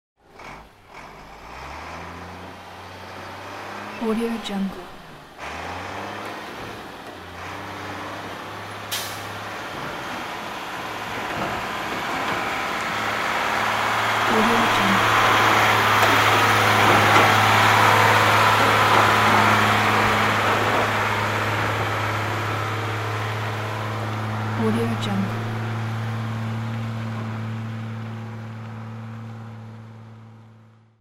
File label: truck garbage truck drives past